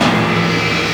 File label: A3 HIT, SYMPHONY; Northstar - The Wizard VOL-1